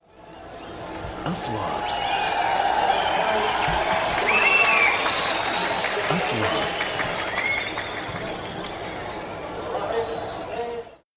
Applause for football players

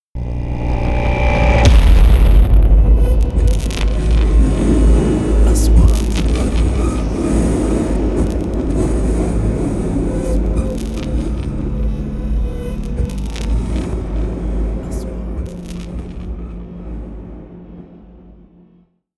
3D SFX Triler; مؤثرات صوتية

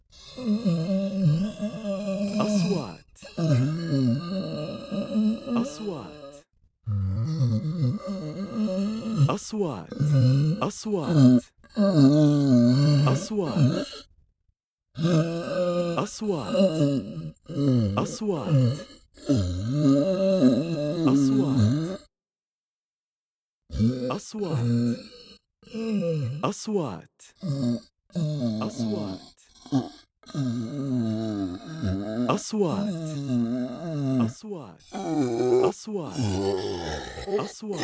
MUMMY SOUND STYLE; EFFECTS

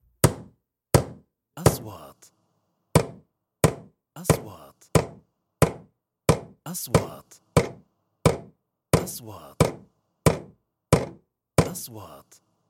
Wood

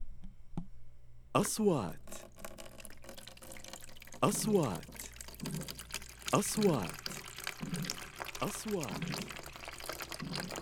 cooler machine; kitchen

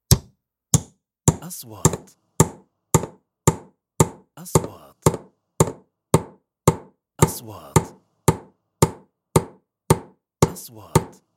Wood

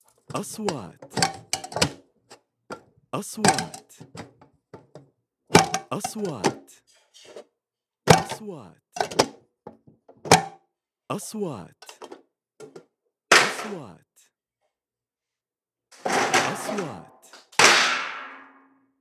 kitchen utensils sound

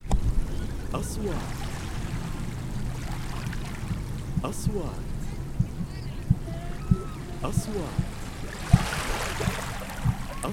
Kids Playing On the beach

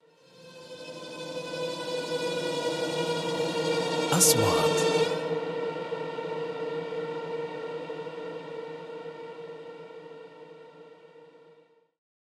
horror

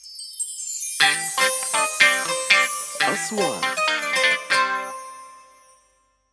intro gt

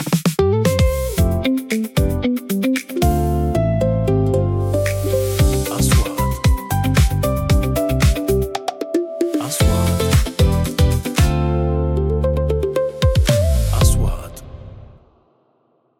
advertisements

